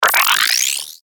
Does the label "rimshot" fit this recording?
No